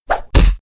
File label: straight punch; attack